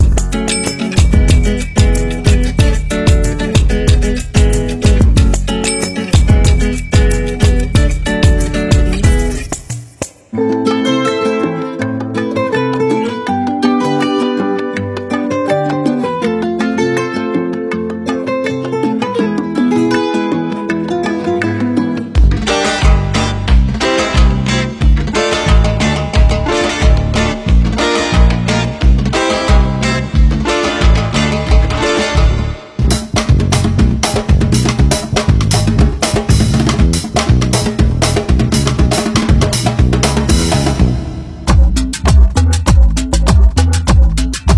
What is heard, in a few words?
Music Production Software; Arcade